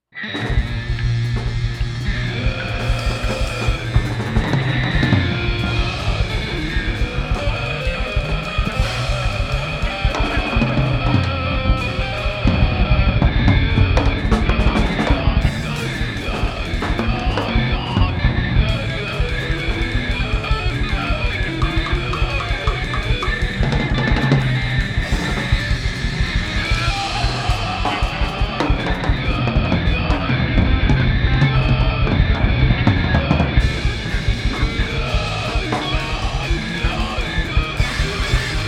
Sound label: Swinging the Metal Vibe